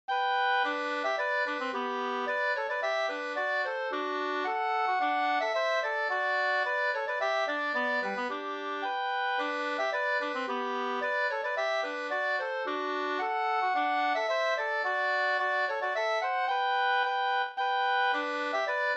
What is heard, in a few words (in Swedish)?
snoa